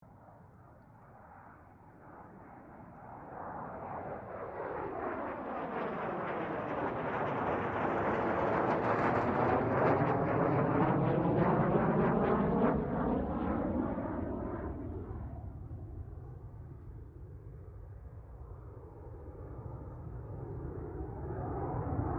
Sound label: AIRCRAFT CA-27 SABRE PASS STEREO; CA-27/F-86 Sabre; Airplane; Airplanes; Vehicles